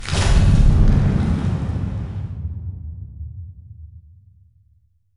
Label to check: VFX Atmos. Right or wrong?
Right